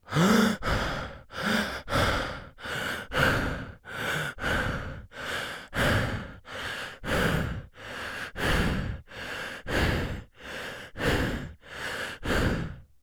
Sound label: Horror Scary Human Male Heavy Breathing